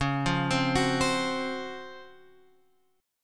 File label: C sharp Major 11th, chord; C#M11 Chord {9 8 10 11 X 8} arpeggiated